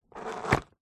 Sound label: household vacuum cleaner set down on tiled floor while off